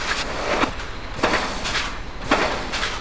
coups

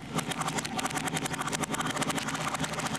craquements

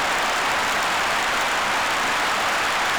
PLUIE

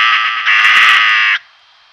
corneilles

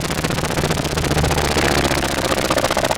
AVION

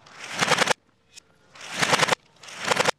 RAFALES

